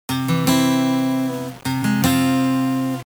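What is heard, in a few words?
16bit arpeggio guitar arpeggio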